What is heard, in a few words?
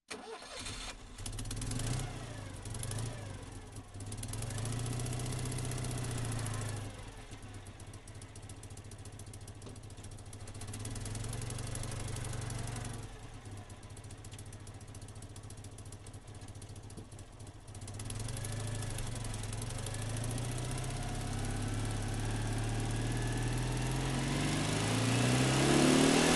citroen 2cv country drive engine; citroen 2cvmod; Sonniss GDC